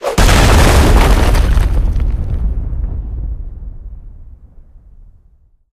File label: taunt yeti ground pound; video games; source engine; tf